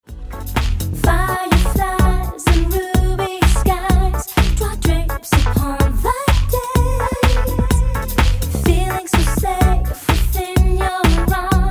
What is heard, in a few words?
pop radio sample, vocals; Pop Dance